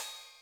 hi-hat, Millenium MPS-400

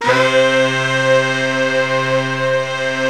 ANALOG COMBO; OMI - Universe of Sounds

